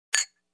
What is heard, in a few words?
Ice Cube in Drinking Glass